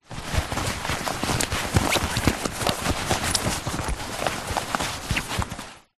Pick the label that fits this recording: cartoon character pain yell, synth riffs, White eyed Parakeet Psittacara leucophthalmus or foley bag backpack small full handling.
foley bag backpack small full handling